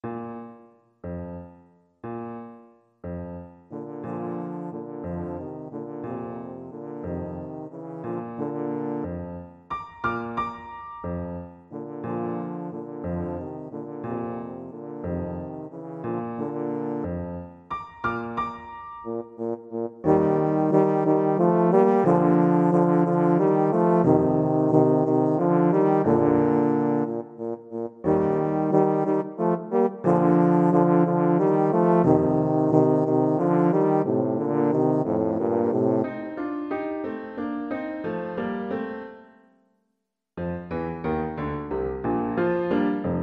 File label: tuba; tuba duet